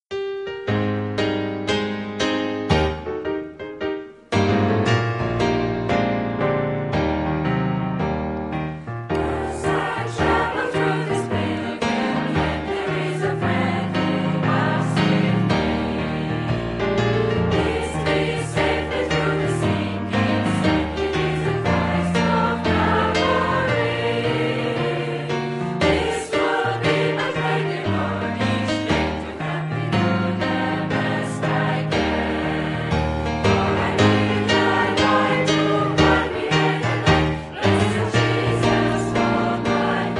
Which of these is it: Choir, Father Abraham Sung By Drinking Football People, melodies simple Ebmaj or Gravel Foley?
Choir